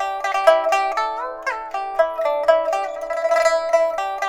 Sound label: PIPA, 90; INIS - Opium